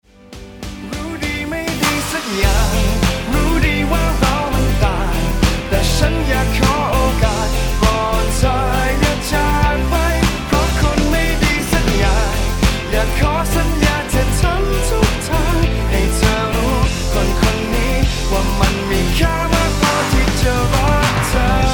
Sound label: Backing track 16 bars